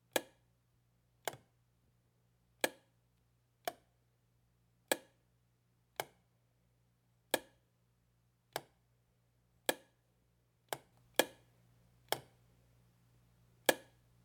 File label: Lightswitch mono